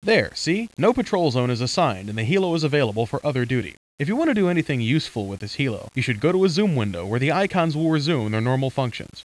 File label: HARP